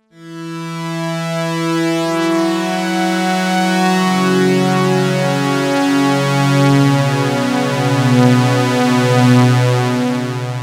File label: jx8p